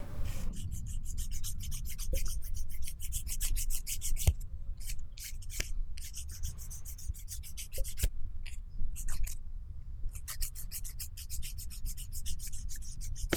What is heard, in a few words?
Filing Nail